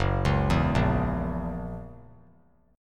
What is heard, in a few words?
F sharp 7th, down; arpeggiated; 3C,3F,3A,3D